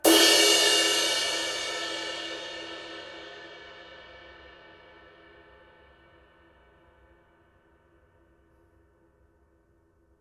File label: 23in A Sweet Ride, cymbal sound